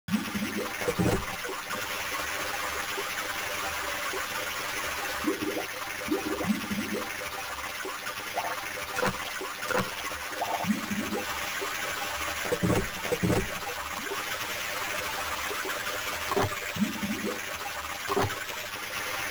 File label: Atmos; Level 3